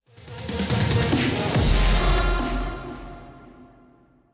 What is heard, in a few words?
recall mus; smite; hades